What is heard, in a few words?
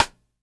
SIDESTICKS